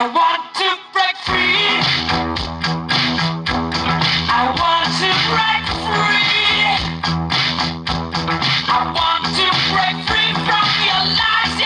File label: ambient